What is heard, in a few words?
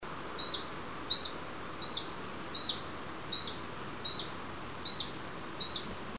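Phylloscopus collybita, Chiffchaff strangely calling; Nigula, Pärnumaa, Estonia 29 August 1998; Linnut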